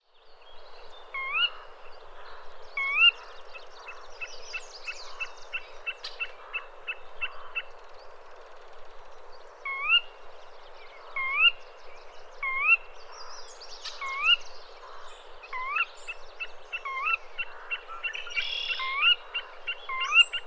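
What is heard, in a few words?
sora voice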